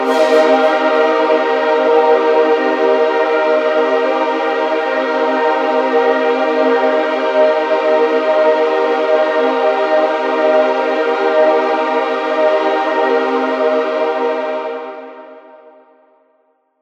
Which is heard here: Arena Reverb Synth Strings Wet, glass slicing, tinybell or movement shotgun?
Arena Reverb Synth Strings Wet